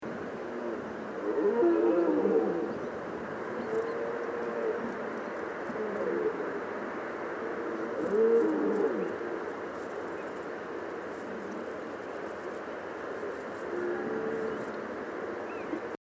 Brame

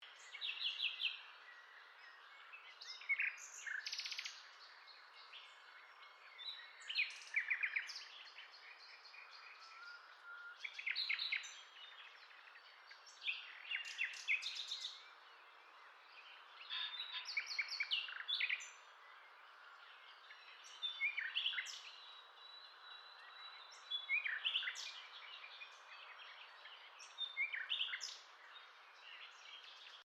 Rossignol philomèle

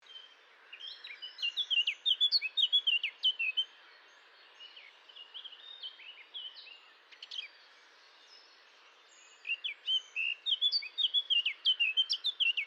Fauvette à tête noire

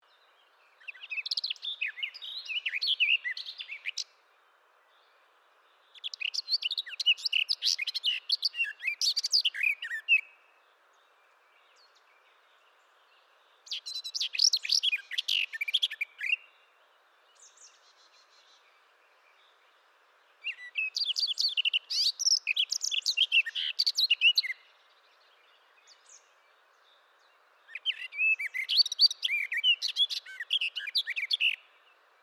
Fauvette des jardins

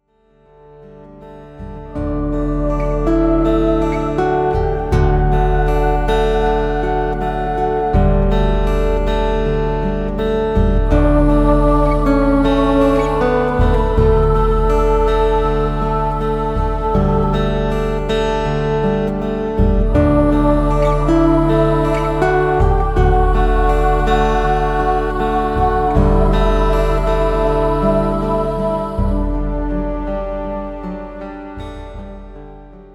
Harmonique

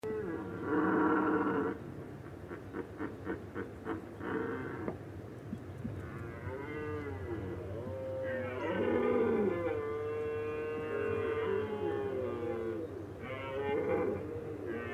Brame

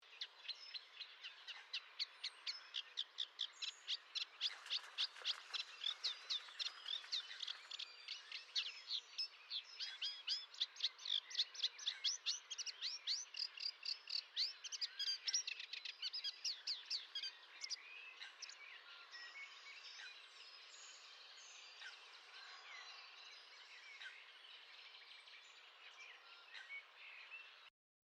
Rousserolle effarvatte

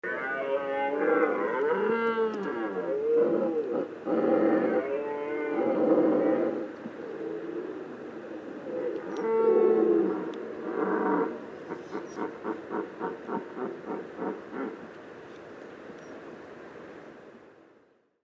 Brame

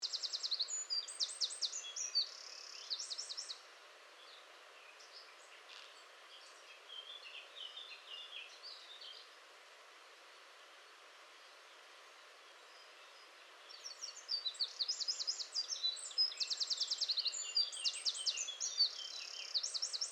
Troglodyte mignon

